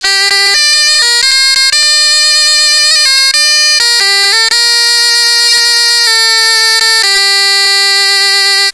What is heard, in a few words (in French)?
bombarde en sol